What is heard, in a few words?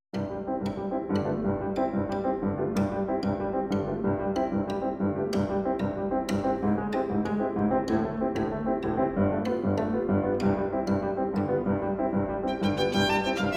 Rumba for 2 pianos or orchestra; Jamaican Rumba